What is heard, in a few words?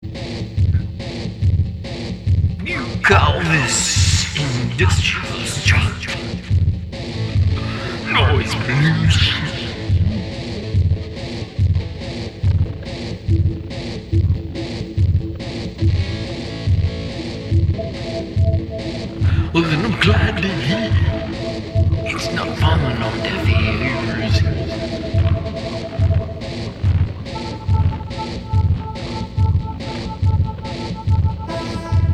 Industrial Strength Noise Pollution